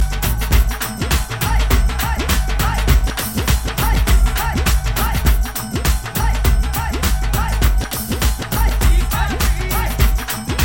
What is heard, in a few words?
Second Dhol